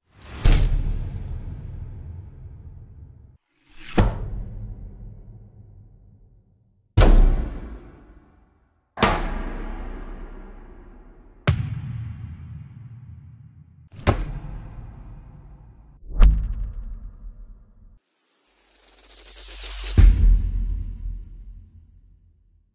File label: Iconic analog hit